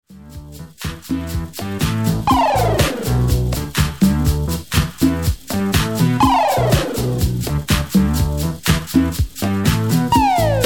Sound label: disco delay